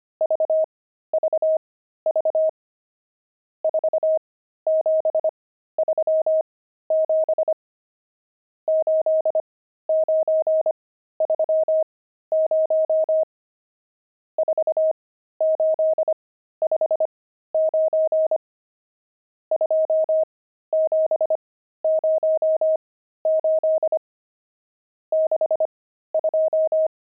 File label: pangram, intermediate pangrams files